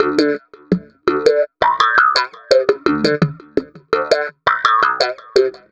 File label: Processed Slap Bass, Electric Bass